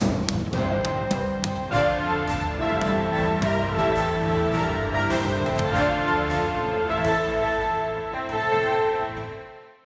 humor suspense

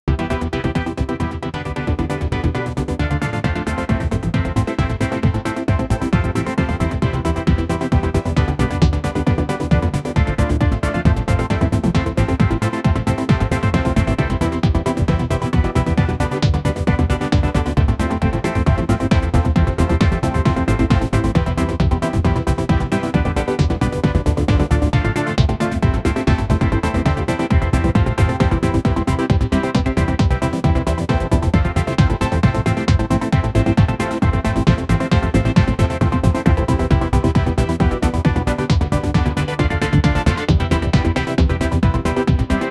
easter dance

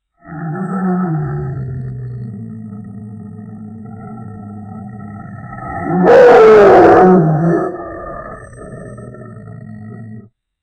a large dog transforms in; monster

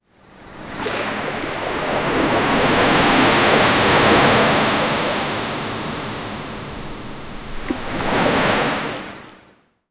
beach

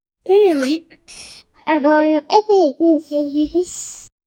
the girl is happy

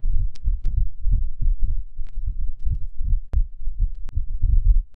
deep footsteps of a giant, bass heavy

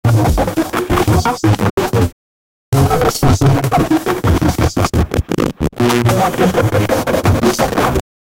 atomic fart; nuclear

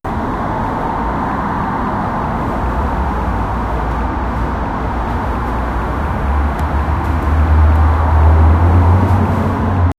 ambient night sound, city

